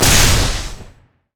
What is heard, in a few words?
guardian spell attack; odin